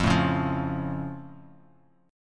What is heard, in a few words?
F Augmented F; strummed